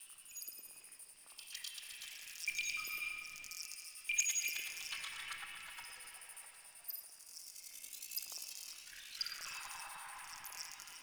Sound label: Critter Ambient Stereo Loop; Warframe; Tau; Ambience